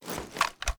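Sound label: sniper reload empty fast extended mag end; gmod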